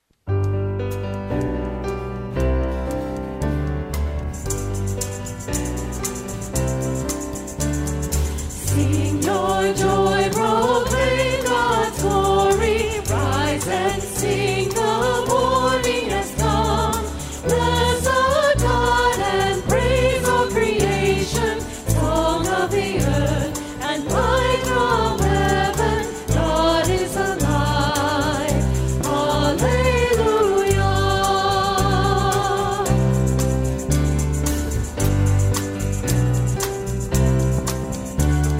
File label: Morning Hymn